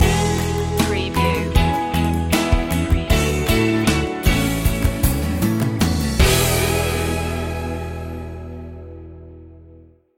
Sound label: promising voyage stinger